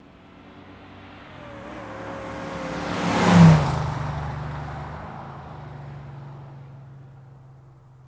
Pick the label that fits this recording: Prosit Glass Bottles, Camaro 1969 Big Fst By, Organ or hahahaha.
Camaro 1969 Big Fst By